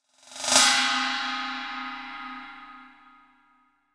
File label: ride